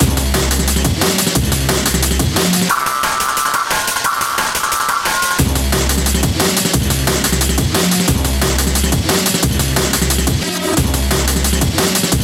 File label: Mega Fresh DnB